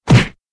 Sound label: hands hit, throw knife; czero